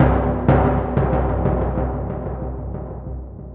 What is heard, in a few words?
Timp b